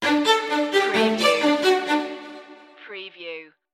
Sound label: corporate technology stinger